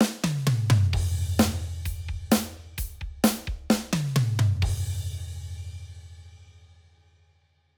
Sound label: Modern Country